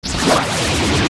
Peahat Hit; OOT

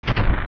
Enemy Bounce Double

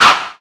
Spin; Link; Spin Attack; Zelda

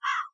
Night Crow

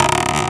Creak; King of Red Lions creaking while cruising (#2 heard most often)

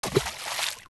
Fishing Rod Splash; Zelda; OOT

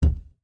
Block Slide End

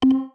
Main Menu Cursor; Move the cursor on the main menu (Recorded)

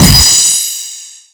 Slash; Link's Final Smash slashes

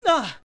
Ledge Fall; Adult Link; OOT

